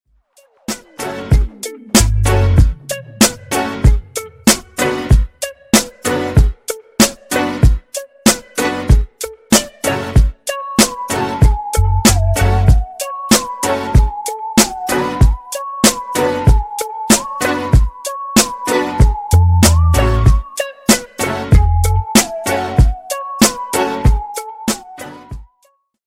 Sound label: instrumental ringtone